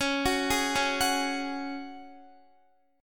Db Suspended 4th Db, Guitar; arpeggiated; x,16,16,13,13,13

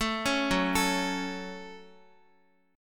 Gb Minor Guitar 4E,4A,5D,5G,5C,6F; arpeggiated; JGuitar

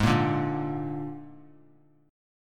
Ab Suspended 2nd Flat 5th Ab, chord; strummed; JGuitar

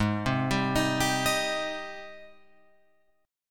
Ab Major 7th Ab down Guitar Open F; AbM7 arpeggiated; JGuitar

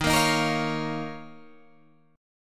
Eb 7th Suspended 2nd Eb, Guitar Drop D

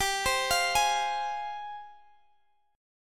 F Minor G, chord; arpeggiated

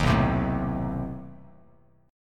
B 7th Flat 9th B down, Bass Standard; B7b9 strummed; JGuitar

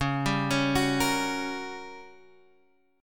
G Minor Major 7th Flat 5th, Db

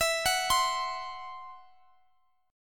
E Suspended 2nd Sharp 5th E, down, Guitar, Open D

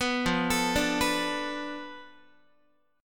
F 6th Flat 5th F down Guitar, chord; F6b5 arpeggiated; 14,13,12,12,15,x

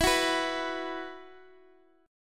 E Suspended 2nd, Guitar Open G; x,x,x,9,7,9

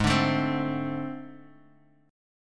A Minor Major 7th Double Flat 5th

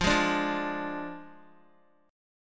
F Minor 6th F down Guitar; strummed; tuning 4E,4A,5D,5G,5C,6F

